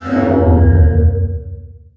UI player leave; DunDef

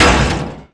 Tower Damage; DunDef

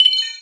Token pickup; DunDef

